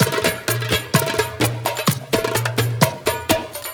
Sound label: Latin House Loop 128 BPM; Vengeance Samples